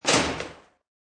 Building Door Open, Large building door opens.; Miscellaneous Doors

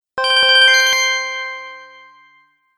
Award, beep; Beep Sound Effects

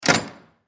Jail Door Shut, Jail Door Shut Jail Keys Jingle; Door Closing; Emergency

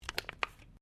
CAN SOFTDRINK BEND; various metal cans; Materials

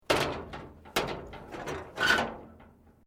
METAL CREAK LIGHT; Materials

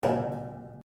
RUBBER ON METAL; Sound Effects:Materials

